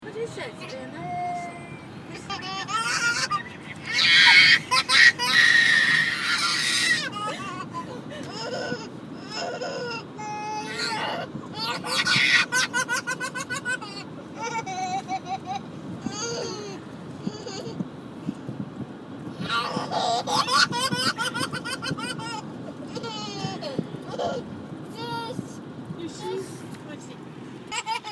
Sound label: Laughing